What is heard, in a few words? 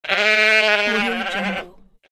sheep